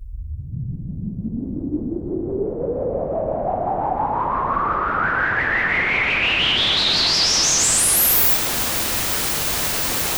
Pulse, Filtered Noise

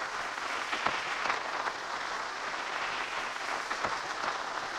Cracklature, sparse soundscape

